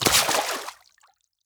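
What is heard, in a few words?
water run, footstep; fst water; skyrim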